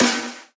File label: trap snare sound b key, drum single hits one shots